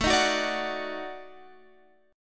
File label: D sharp Minor Major 7th Flat 5th, Guitar